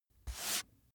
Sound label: book dust off single